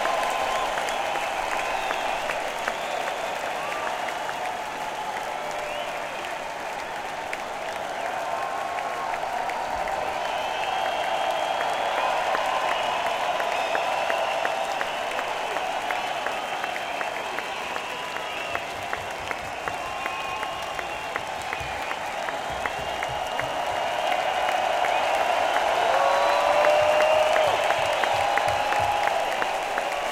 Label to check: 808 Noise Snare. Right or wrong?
Wrong